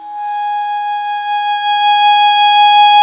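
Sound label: WND, English Horn, Swell; Roland L-CDX-03 Disk 1; Eng Horn Swl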